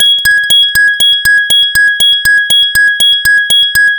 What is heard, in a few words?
noise, Noisey One, ringtones; Google; NewWave Labs